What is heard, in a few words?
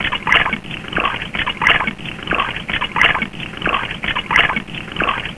washing machine; Sound Effects for Multimedia - Volume 1